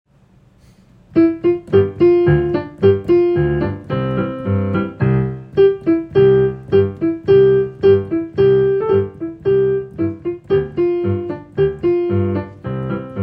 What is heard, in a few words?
Caissons Piano; Caissons go Rolling Along; 4th Grade Music Class